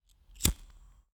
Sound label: cigarette lighter